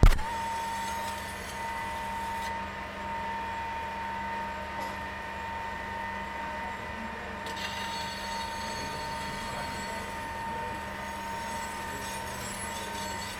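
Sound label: CORRECTION MACHINE, GLASS FACTORY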